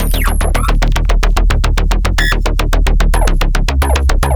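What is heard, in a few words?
synth loop dark cyber world 110 D# minor; Virtual Riot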